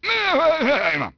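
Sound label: male angry; People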